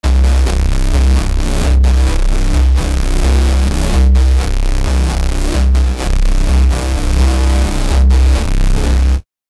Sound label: a deep reese bass in, techno